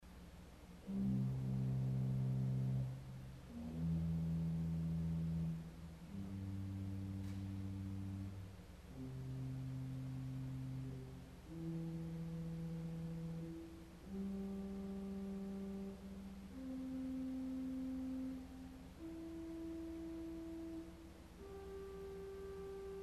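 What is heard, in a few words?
Gemshorn Arpeggio, organ stop; CulverAcademy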